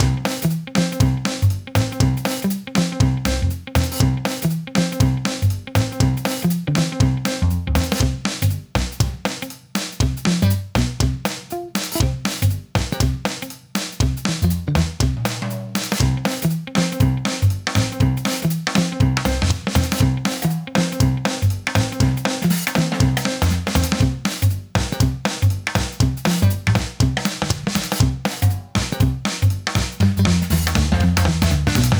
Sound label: BATTLE BOSS Chase LOOP